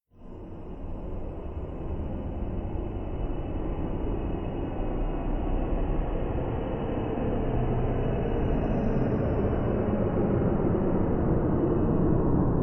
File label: Spacecraft Landing SciFi Engine Winding Down; Ascending Tone; Spacecrafts